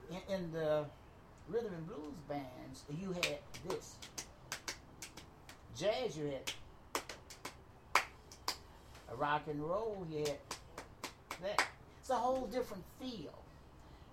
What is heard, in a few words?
Rhythms